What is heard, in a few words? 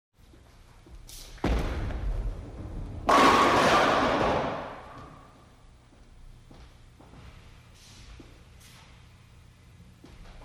Bowling Sequence; SOUND EFFECTS1